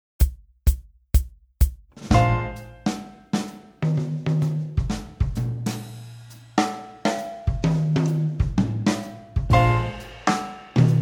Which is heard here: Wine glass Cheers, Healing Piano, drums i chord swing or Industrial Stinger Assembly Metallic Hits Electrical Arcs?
drums i chord swing